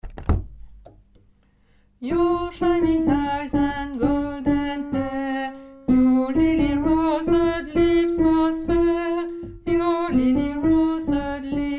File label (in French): alto